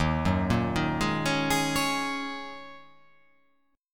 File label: D sharp Minor 11th D sharp, chord